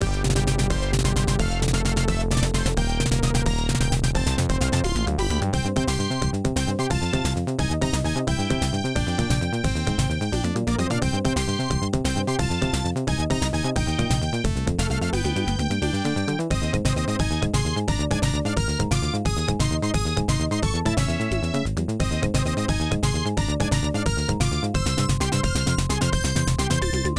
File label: Combat